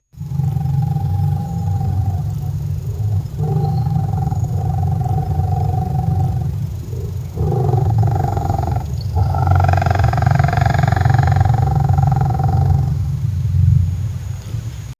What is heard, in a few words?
roaring lion and chipanse, safari